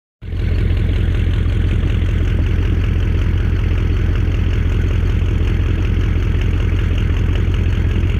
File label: Six five liter diesel